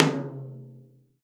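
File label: ambient high tom, Acoustic kit; Akai MPC2500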